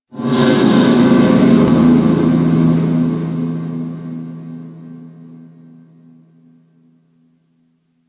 Distant Metal Groan; Ambience; Warframe; Corpus Infested

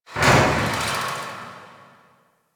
Corner Hall Rand Metal; Warframe; Ambience

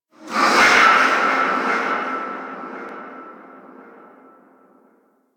Stadium Infested Medium; Warframe; Ambience; Nineteen Ninety Nine

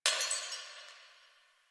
Distant Glass Breaking

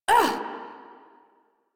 Pain; Priest Quest; Palladino

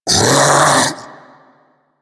Infested Splitter Vocal Attack Medium; Warframe; Grey Strain

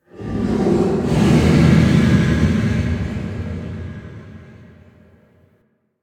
Growl Interior; Ambience; Unum Murex; Warframe; Quests; The New War; Cetus; Unum Tower

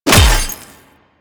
Melee Hammer Impact Robotic Flesh

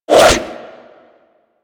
Boomerang Throw; Warframe; Weapons

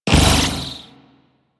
Briefcase Scythe Proj Bounce C; Crp Briefcase Scythe

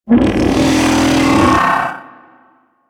Step Movement; Enemies; Steps; Warframe; Corpus; Arachnoid Camper

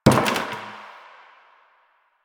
destructible bucket impact

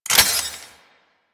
Quill Dart Gun Reload; Warframe

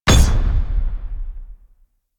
Flak Cannon Alt Fire Explosion; Warframe; UT Flak Cannon

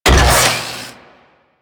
Saw Blade Launcher Mech; Warframe

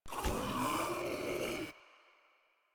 Infested Ancient Vocal Pain; Warframe; Proto Infested Ancient

